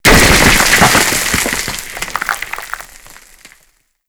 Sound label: ceiling rock fall break; Call of Duty Modern Warfare